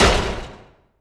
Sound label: asteroid damage metallic medium; Factorio